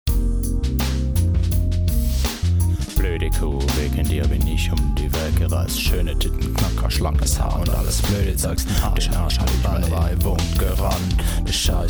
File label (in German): German HipHop